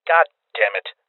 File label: Sniper Miss